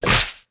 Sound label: attack tell, headcrab black; creatures; vox